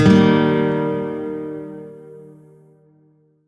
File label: Shawzin Blues Chord B; Warframe; Lotus Shawzin